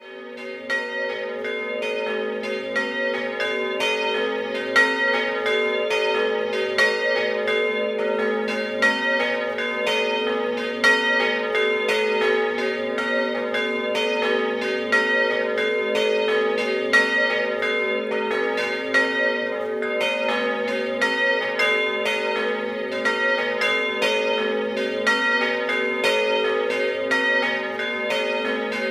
San Martino Doppio, campane

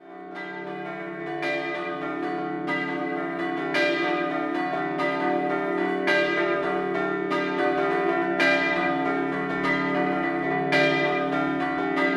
Chiusaforte Scampanottata, campane

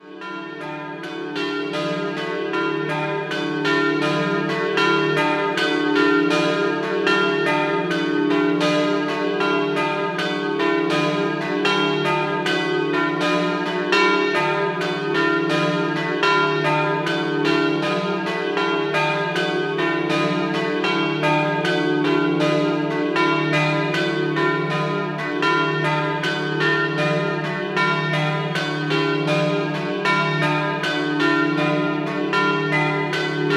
Carpacco Doppio, campane

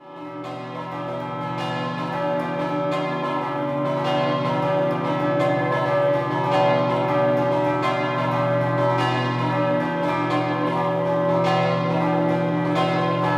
Aquileia, campane

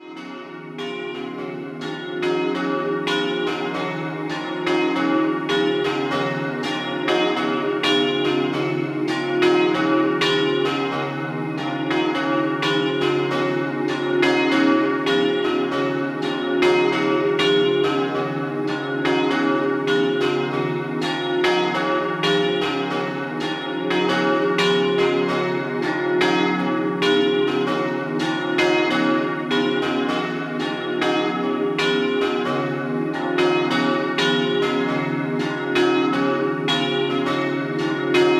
Savorgnano, campane

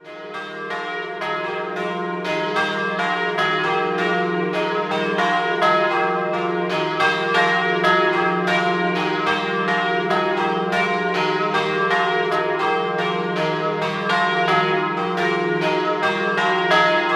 Turrida Doppio, campane

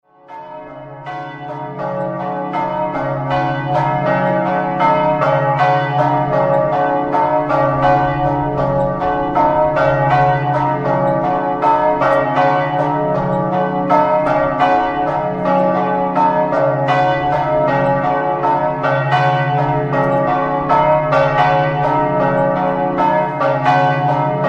STREGNA, campane

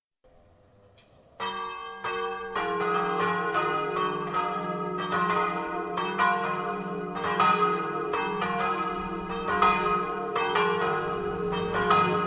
RUALIS, campane

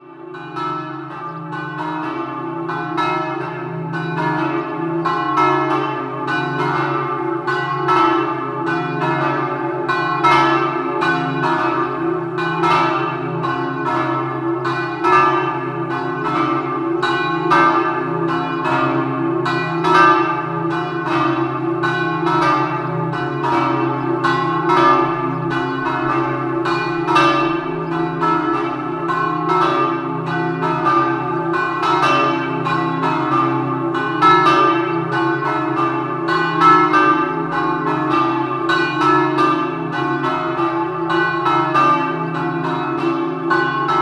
Vivaro, campane

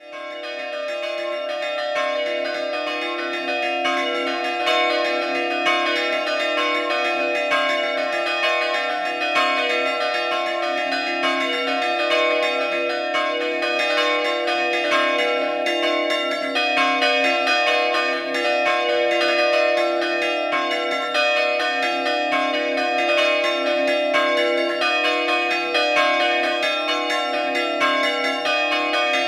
Vico Scampanottata, campane

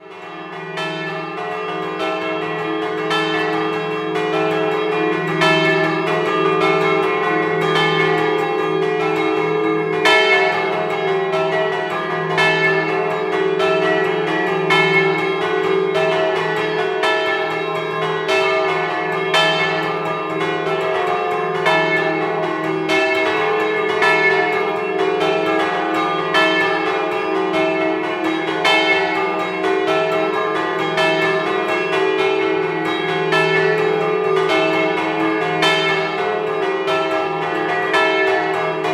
CODERNO, campane

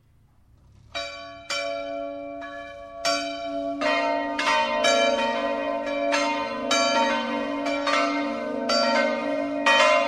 CORGNOLO, campane

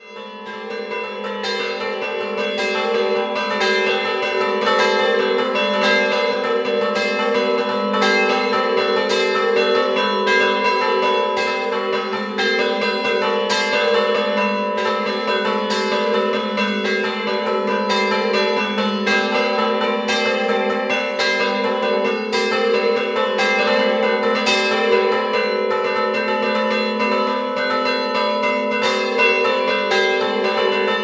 COLLOREDO DI M A, campane

